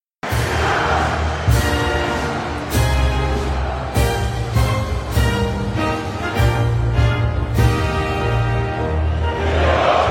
Portugal National Anthem; EURO 2016

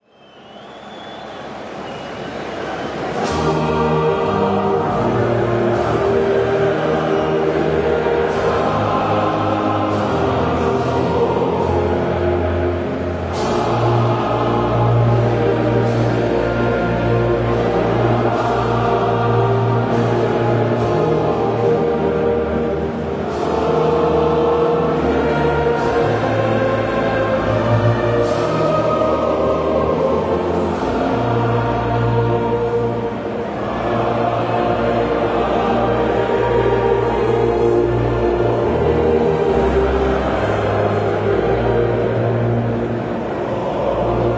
Austria National Anthem; EURO 2016

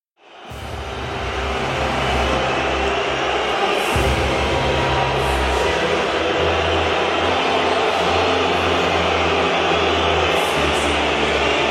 Russian National Anthem; EURO 2016

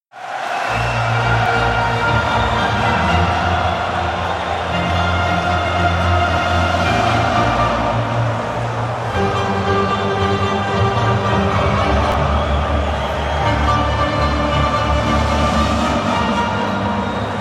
Italy National Anthem; EURO 2016

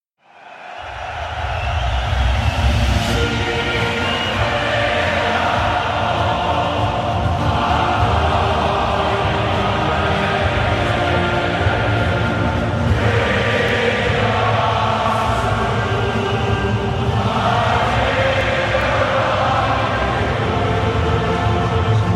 Ireland National Anthem; EURO 2016

